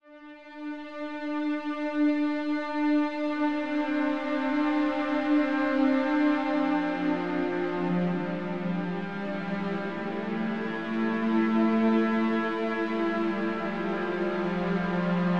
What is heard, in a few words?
pad